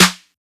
snare splat; Hip-Hop; Drums; Drum One Shots; Slate; CAICOS Hip-Hop Sample Pack

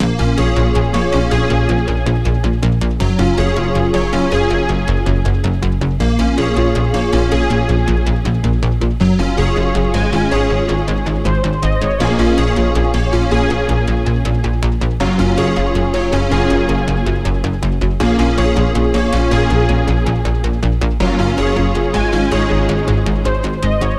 songstarter 160 Bbm; Slate; SD PASADENA Pop Sample Pack

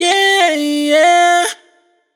riff Yeah Airy Tuned Gm wet, vocal; Slate Digital Omnivox Vocal Sample Pack

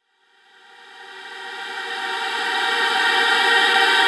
reverse, vocal sample

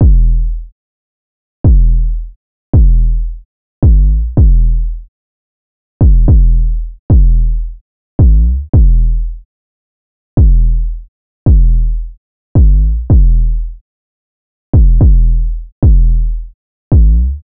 808 loop 110 E; Slate Digital BASSHOLE Sample Pack; 808 Loops

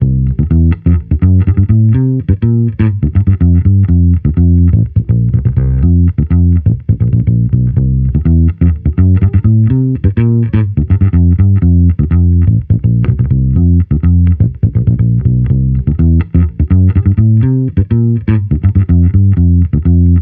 Amped loop 124 C, Electric Bass Loops; Slate Digital BASSHOLE Sample Pack

